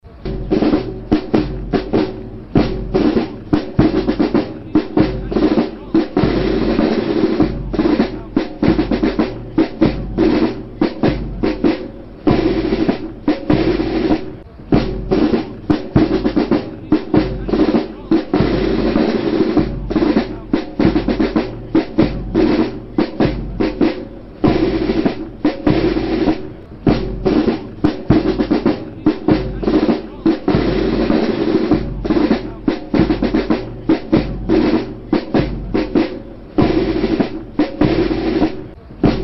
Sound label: marsh